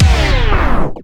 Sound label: DJ Disco Stop